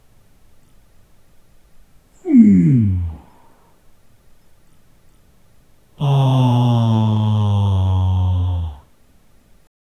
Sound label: when a man wakes up